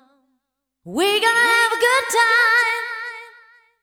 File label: deep house female, 125, A, vocals